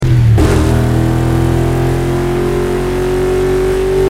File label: third, vehicles